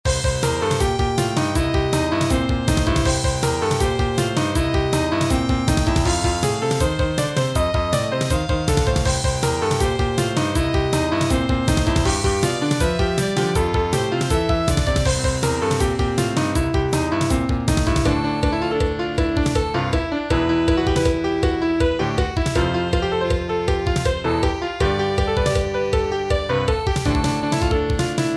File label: drums